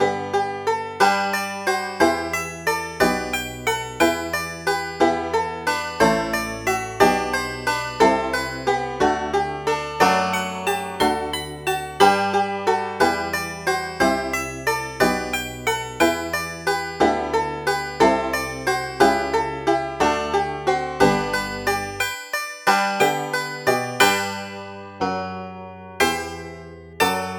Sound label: banjo